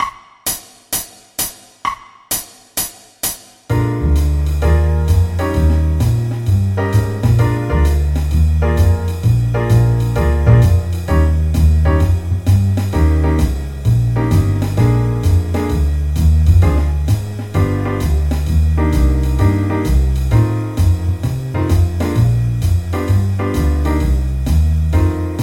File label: improv etude